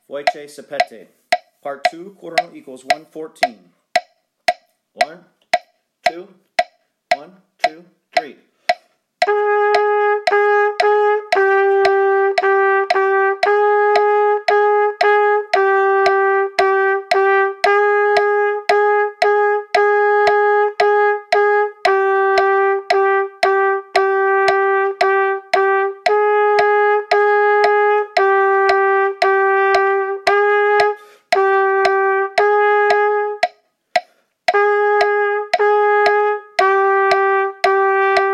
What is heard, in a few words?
Easy Duets, qn=146, trumpet; part 1